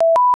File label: item pickup; heros